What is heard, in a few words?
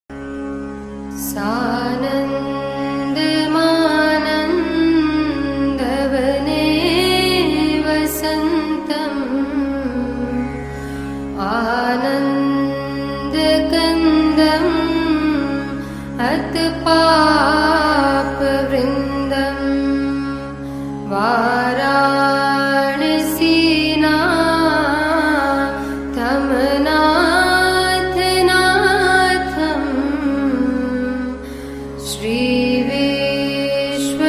shiv mantra meditation peace song; kashi vishvanath